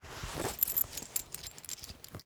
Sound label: Foley Coin Remove Coins From Pocket